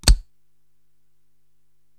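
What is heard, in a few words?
HH Gun Clikr, hi hat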